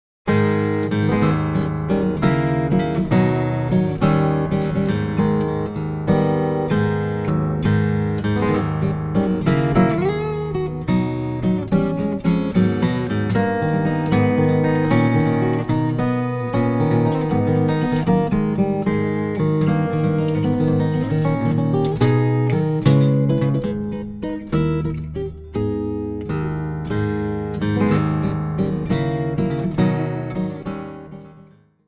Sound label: russian waltz